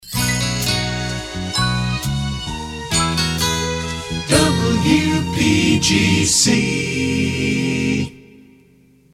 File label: christmas kit slow, Jingles